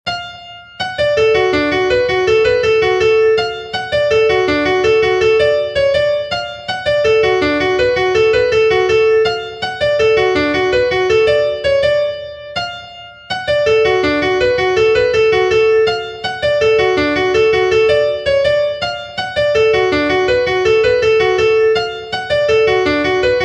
REEL, fiddlers; CHICKEN

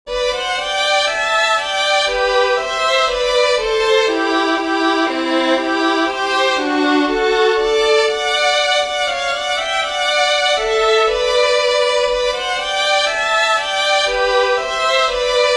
WALTZ with harmony, fiddlers; NEW BRUNSWICK; southern kings fiddlers

